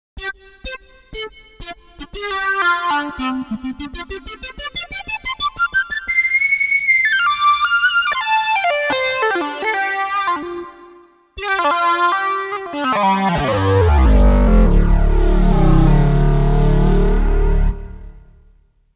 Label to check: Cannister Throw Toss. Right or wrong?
Wrong